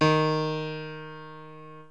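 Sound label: piano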